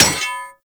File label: Melee Axe Impact Generic; Axes